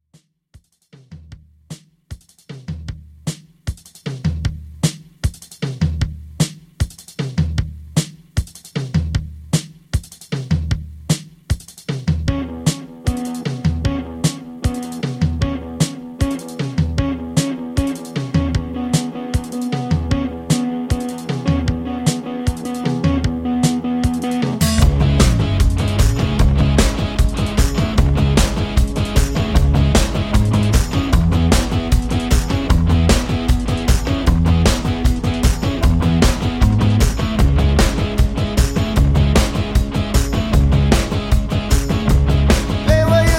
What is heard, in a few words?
Dj Intro Outro 153Bpm